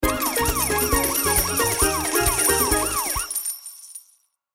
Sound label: BGM Jackpot; Realm Saga